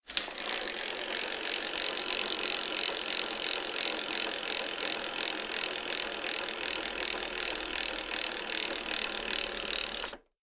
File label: transport bicycle free wheel sproket spin